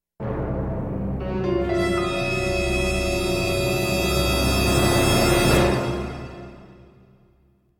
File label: suspence short; Chrome